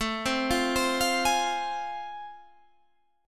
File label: A Minor Major 7th Sharp 5th A, down